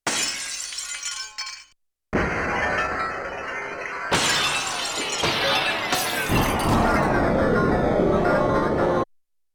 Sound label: Broken Glass down II; Yamaha TX16W; Sound FX